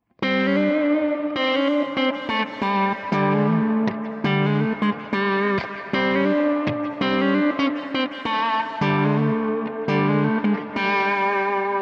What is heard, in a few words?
Big Sky Reverb Spring